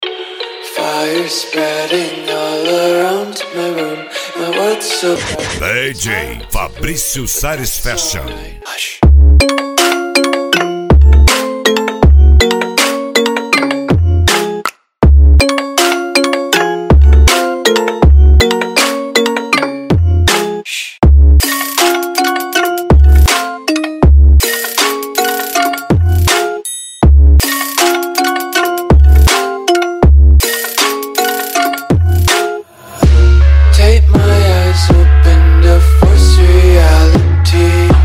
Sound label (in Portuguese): sound car mala aberta e mega funk